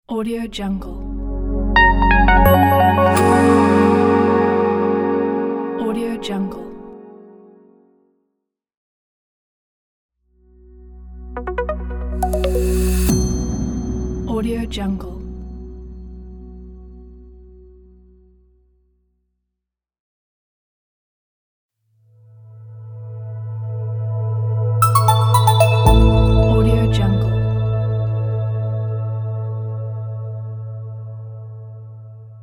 minimal pluck logo pack

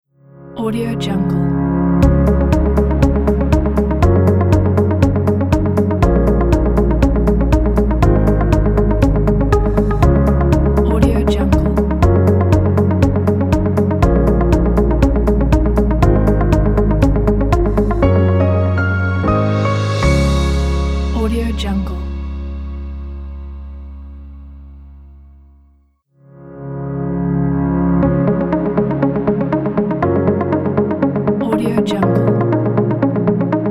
ambient corporate logo

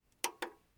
dvd player buttons; prosoundfx

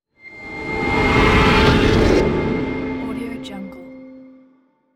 horror riser scare

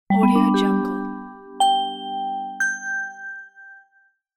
small positive bells; macro-media

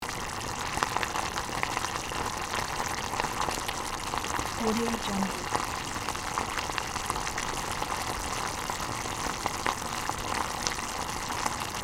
roasting loop

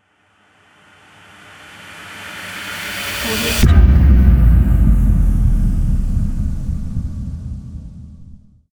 air boom

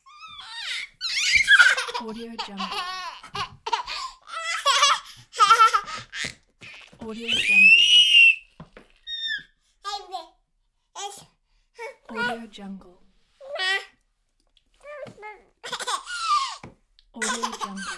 one year old child babbling playing

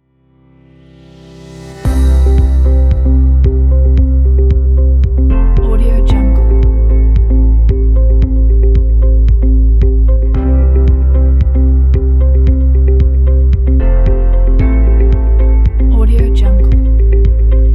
ambient corporate inspiring kit

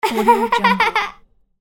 little girl laughter

